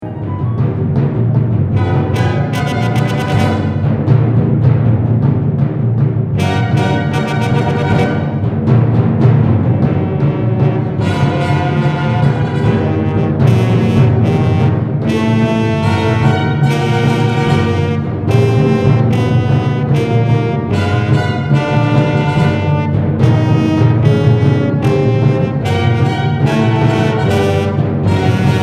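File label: brass percussion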